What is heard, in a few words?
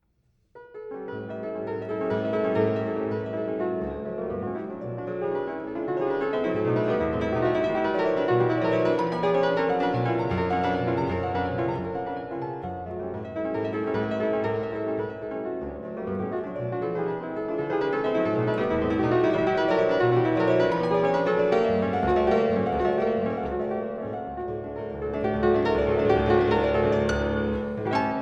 Preludes Op 28 No 5 in D major, Piano; Frederic Chopin